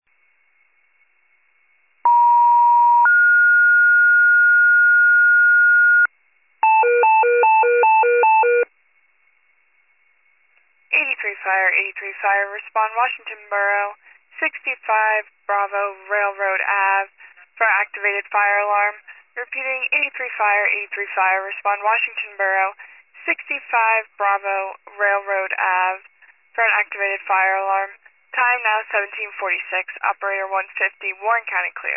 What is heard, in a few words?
FIRE pager alert